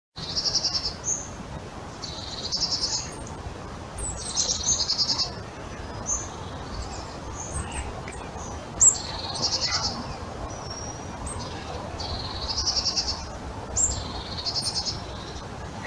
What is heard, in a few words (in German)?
Stadtwald draussen Bussarde